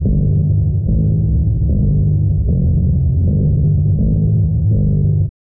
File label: bass forboding, Loops